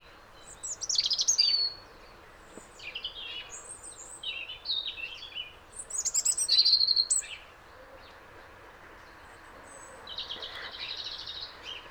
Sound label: Robin female call and song; Lytchett Matravers